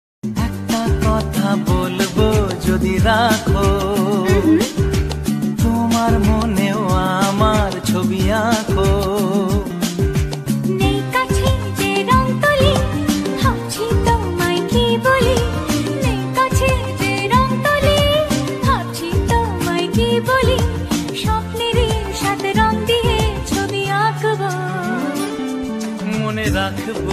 Bengali Love Song Ringtone

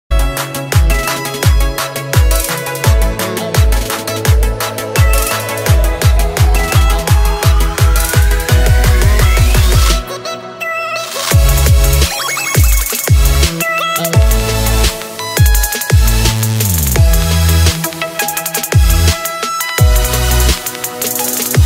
Ringtone; ringtones

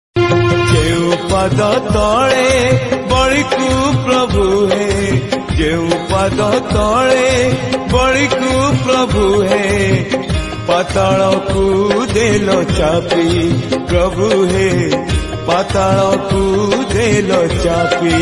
Jagannath Odia Bhajan Song Ringtone

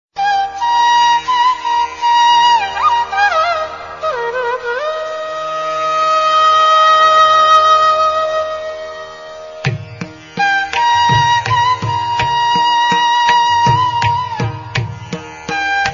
Bansuri, Flute Ringtone

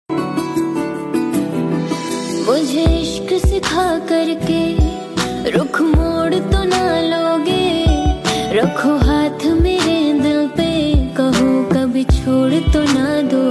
Female Version Ringtone

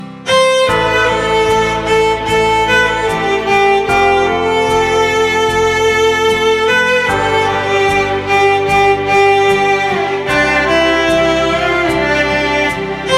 Ringtone Instrumental

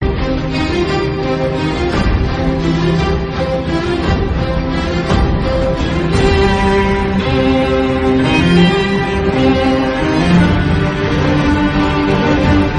Instrumental; Game of Thrones

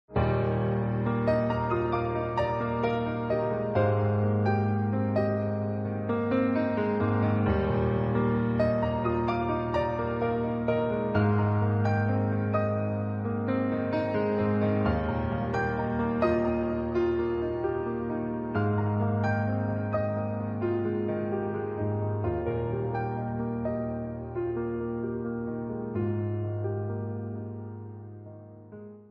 Ringtone; ringtones

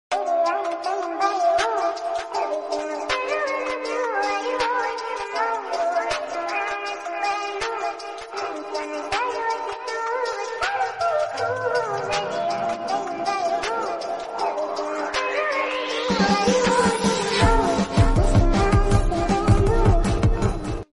Japanese Love Ringtone

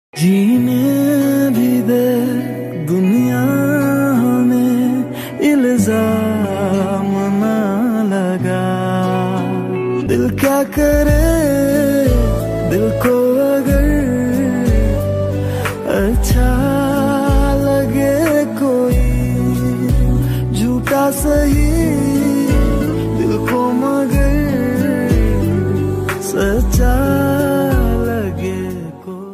Romantic Bollywood Song Ringtone

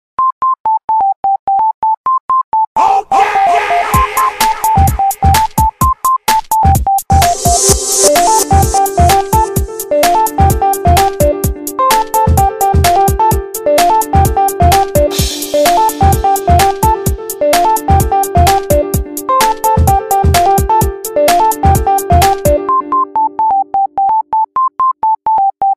New Vodafone Ringtone; ringtones

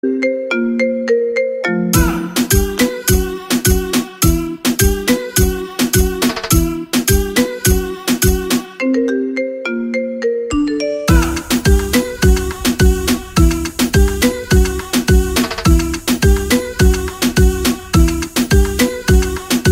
iPhone Ringtone

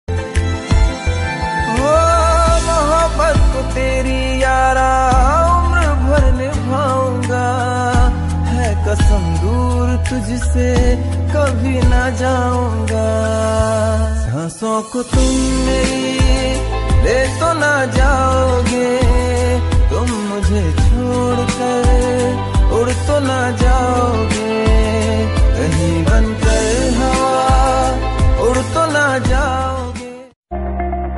Cute Couple Hindi Love Song Ringtone; ringtones

